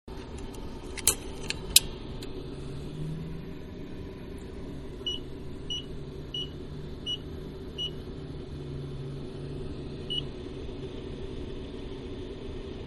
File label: Automated Bank Machine; Miscellaneous Electronic; Sound Effects:Electronics; Manhattan Production Music